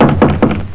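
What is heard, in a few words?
door knock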